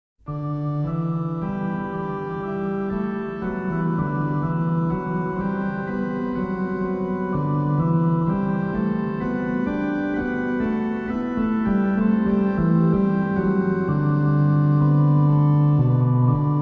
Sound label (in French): interlude d'orgue